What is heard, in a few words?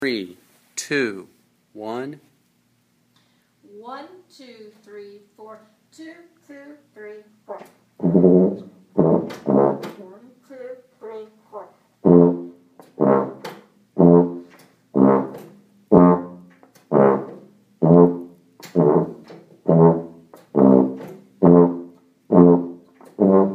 Tuba